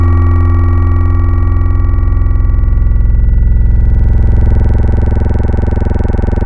Chill; Synthetic's Serum Collection; Echo Sound Works Sphere

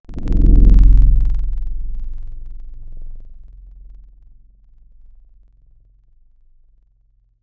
Violin Stac; Instruments; Synthetic's Serum Collection; Echo Sound Works Core Tables

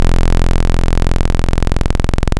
Square, Saw; Synthetic's Serum Collection; XLNT-Skrilla Tables

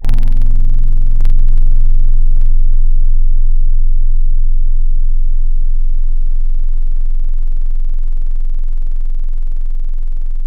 Synth Harp; Synthetic's Serum Collection; Echo Sound Works Sphere

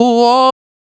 Noises Vocal Shot

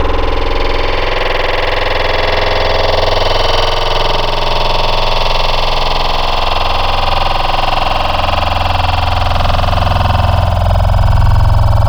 Spectral Bearish, Wavetables; ESW Spectral

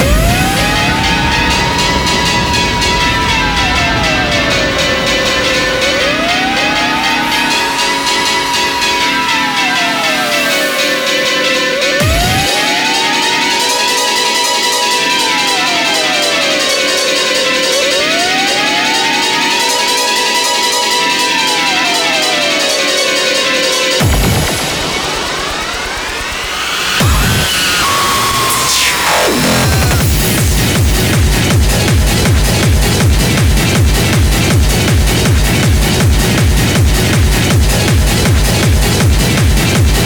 Schranz; Schranz X

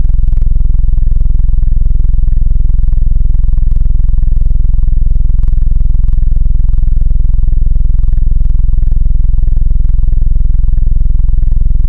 Jitter Sub; Synthetic's Serum Collection; Echo Sound Works - Modular

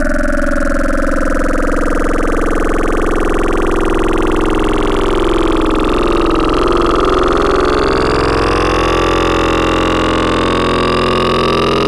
Throaty; Digital; Synthetic's Serum Collection; Echo Sound Works Core Tables

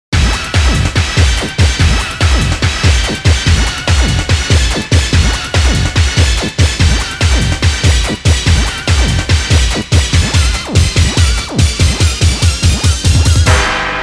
fighting; Initial D Sound Files Vol. 2

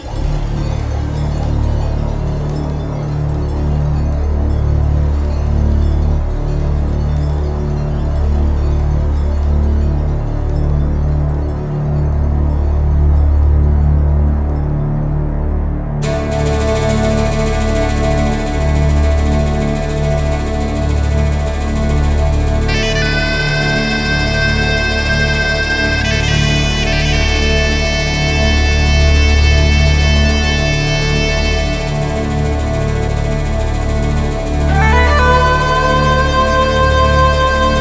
FANFARE; Touhou

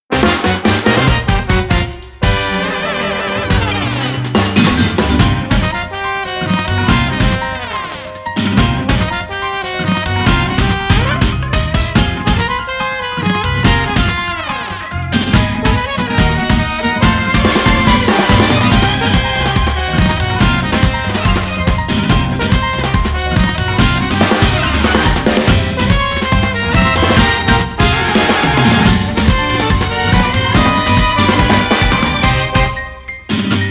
instrumental

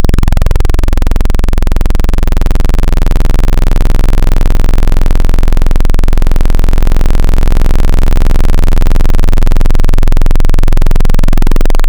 Sine Saw Reveal; Wavetables; Synthetic's Serum Collection; ESW Core Wavetables; Echo Sound Works Core Tables

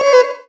AD LIB C3; Evolution Of Sound; Tropical Revolution

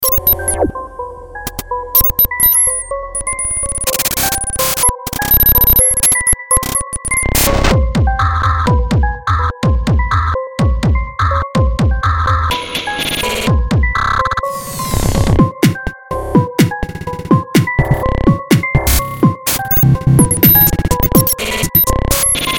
rain drops, lolicore

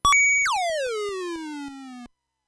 Startsound

